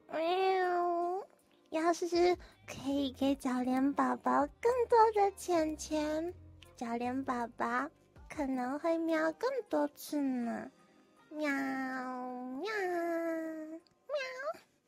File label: cat